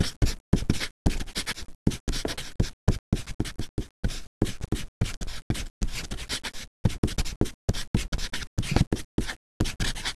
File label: magic cap write; easyrpg; WitchsHeart